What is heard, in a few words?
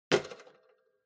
Kitchen Scale Weigh Set